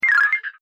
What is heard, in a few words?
beep